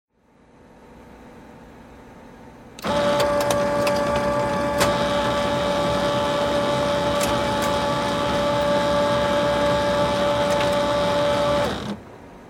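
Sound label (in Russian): звуки работы сканера сканер копирует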